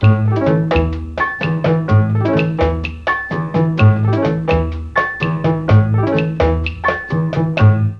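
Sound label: bass strings, Mellotron; Mike Pinder - Mellotron Archive CD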